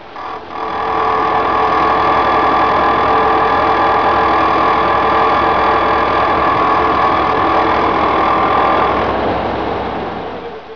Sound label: bent fan; Muir